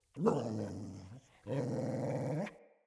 Pack Wolf Idle Grunt; Animals

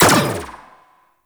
Energy Rifle Fire; Warframe

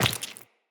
Step Flesh, Footsteps, Walk; Characters; Tenno

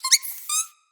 Misc Squeaks, Animals, Vocals; Floppet

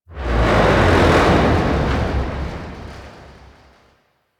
Destruction Texture; Warframe; Corpus; Railjack

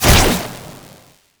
Amp Chassis Fire; Warframe; Weapons; Operators; Amplifiers; Corp Amp Set One

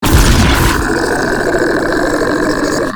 flying mouth attack inhale; Enemies; Warframe; ManInTheWall

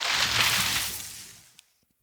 Rage Vines; Fey; Champion

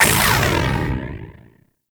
Ball Angry; Tenno; Warframe; Missions

